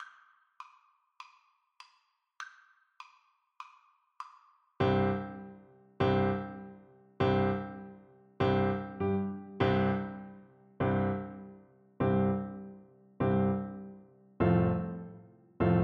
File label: 1812, Flute